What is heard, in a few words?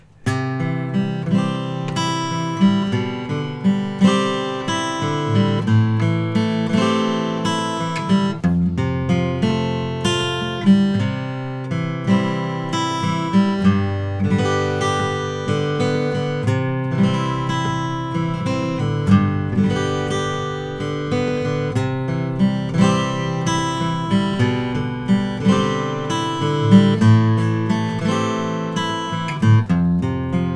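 martin custom 000 14 fret hide glue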